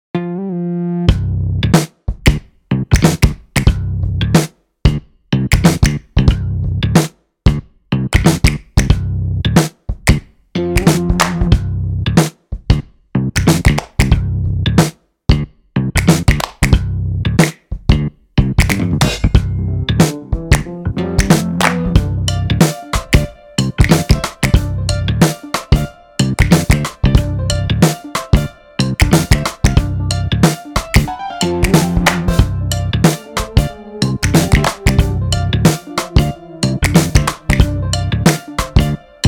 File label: bass